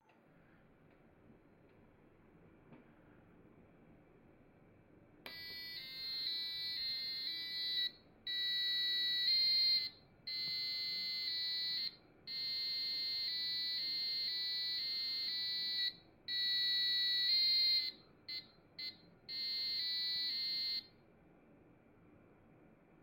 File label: buzzer march